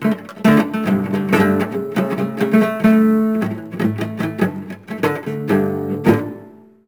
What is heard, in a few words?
Cello Strum Badly; SFX Kit; Foley - Misc